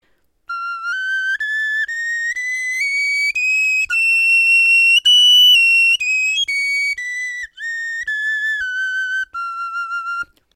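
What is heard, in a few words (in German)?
tonleiter, Knochenflöten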